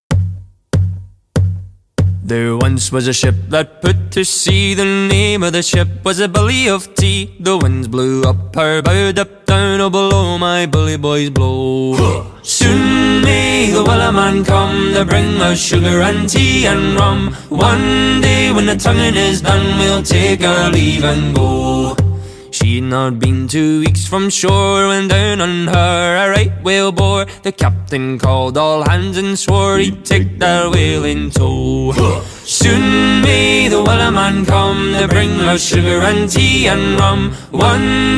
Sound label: sea shanty